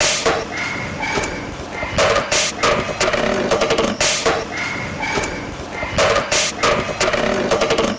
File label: rythm